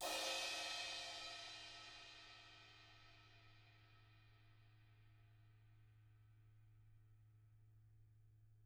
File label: CYMBAL KITS